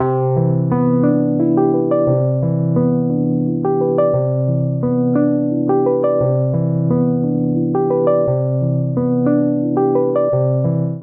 Ostinato Two Hand Arp 3/4 C Major7 87 bpm, Electric Keyboards; MIDI Clips; Ableton; Factory Packs